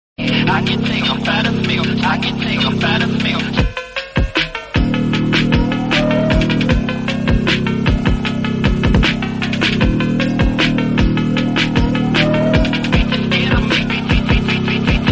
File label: Warning Song